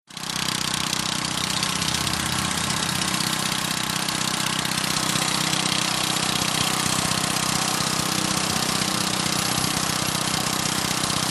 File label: MACHINES - CONSTRUCTION & FACTORY AIR COMPRESSOR: EXT: Motor, constant run, medium distant.